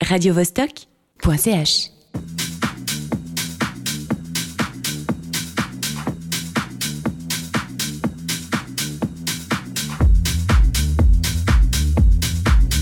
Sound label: chromatic noise mix